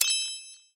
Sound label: a foundation placed; zhipai